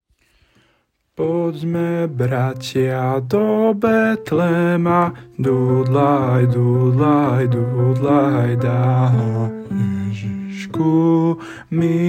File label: bass; Podme bratia